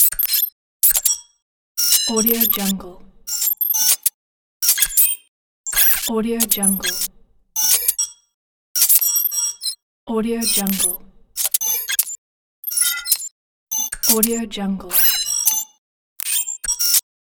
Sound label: high tech mechanical devices